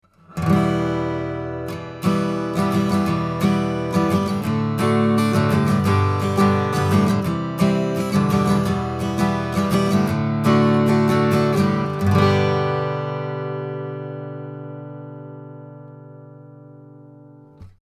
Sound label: Vox-O-Rama U49 Acoustic Guitar 60cm